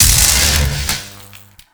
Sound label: Alt Orb Zap; Weapons; Warframe; Tenno; Grimoire